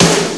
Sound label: SNARE